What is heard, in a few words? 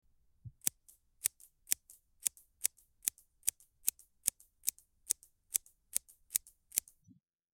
Scissors cutting hair